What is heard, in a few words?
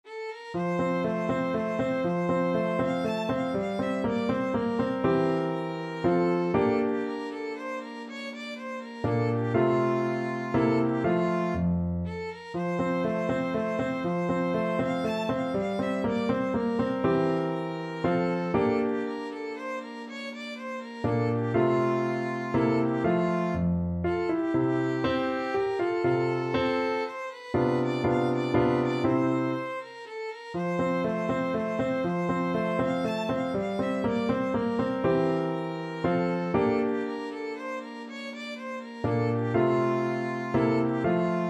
haydn minuet violin